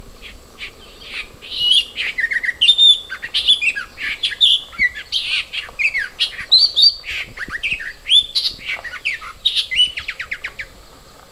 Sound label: Nightingale reed warbler Acrocephalus luscinius saipan